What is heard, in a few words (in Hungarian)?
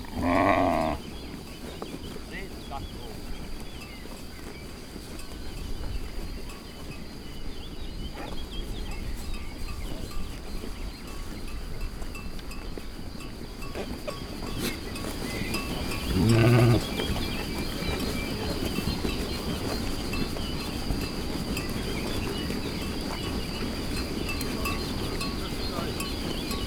legelo nyaj tavolban juhasz, juh; állathangok; paraszt udvar